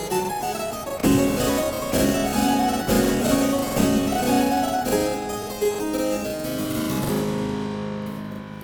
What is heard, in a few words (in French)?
K141 Transition ralentie